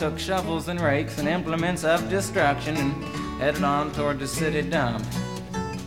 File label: music; wu xing; earth